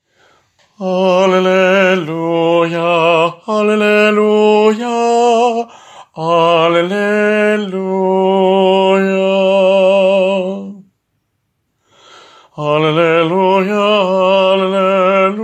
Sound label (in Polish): TENOR